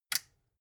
metal button push power on

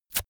notepad sheet tear up fast

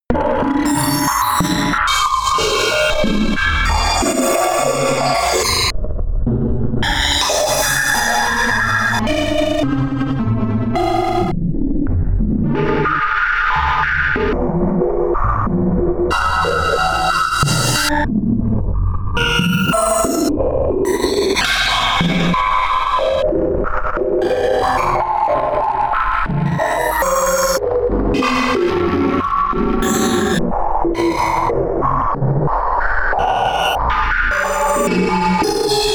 atmosphere extreme erratic craziness high

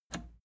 plastic button scratch smooth release disable

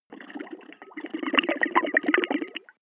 bubble squish

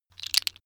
tiles wood

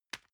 envelope drop soft

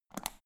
power button turn on off quick

